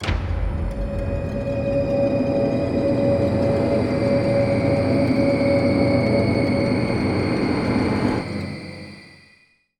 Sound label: near win; netent